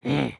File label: Walk; Lego Strombie; build mode